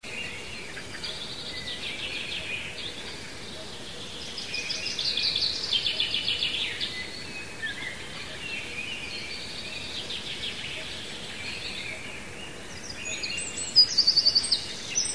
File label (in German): vogelgezwitscher